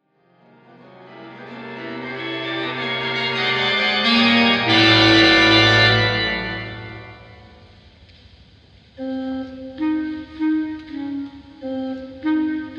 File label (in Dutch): Fragment uit Allons Y voor basklarinet en kerkorgel